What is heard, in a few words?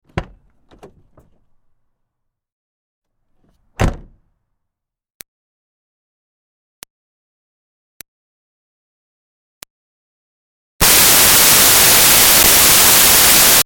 Car Smart Car Door O-C Med; 2005 Smartcar Fortwo; Vehicles; Big Room Sound